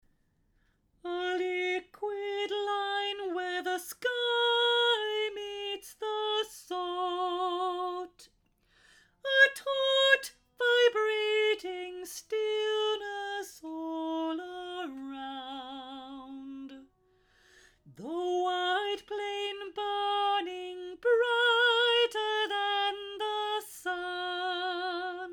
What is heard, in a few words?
Soprano Verse